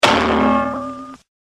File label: hammer